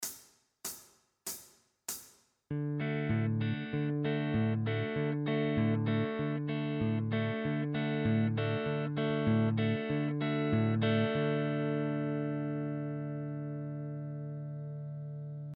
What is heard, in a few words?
Backing Track; bluegrass guitar mastery